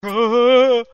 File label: huhuhuh